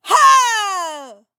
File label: voice attack female; Blind Gladiator